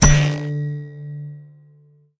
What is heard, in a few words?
trap level; The Croods